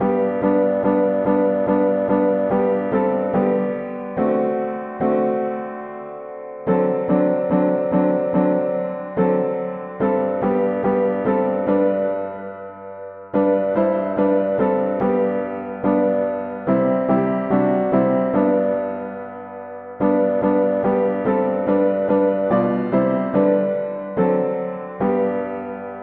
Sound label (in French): Je suis la lumiere mono piano; hymns